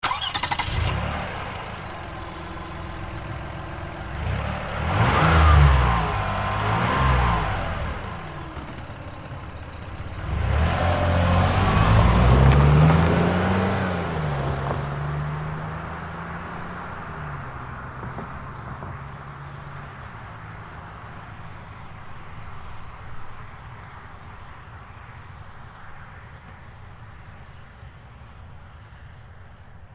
Car Highlander Start Revs Away Fast; 2005 Toyota Highlander V6; Cars; Vehicles; Big Room Sound

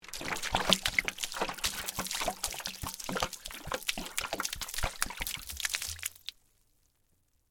Water Bottle Dump, Wiper, Fluid, Long, Heavy; Pouring Sound Effects; BlastWave

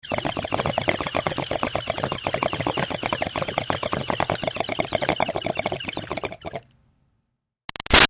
Bong Water Pin In Inhale Bubbles Long; Nature

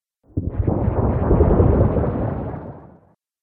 Underwater Element, Water; nature

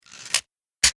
Click Mechanical Weapon Clip Latch

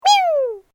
Created Creature Small Call, Alien Sound Effects; Created creatures, vocalisations and pretend speech

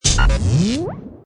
Hi Tech Panel Servo Button

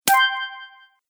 Loot Coin, video game

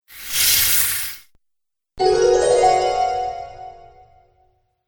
Magic Spells; Magic Sound Effects

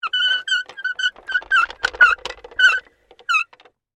Industry, Cart, Roll, By, Slow, Squeaky, Wheels; Rolling

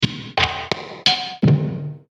5 Clicks Long With Deep Reverb

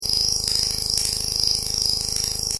Scifi SFX Loop, Short; Stuart Duffield

